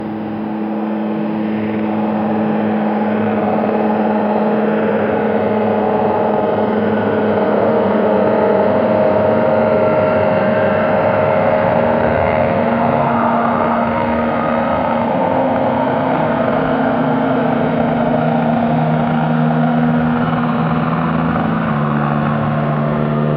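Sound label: distant constant helicopter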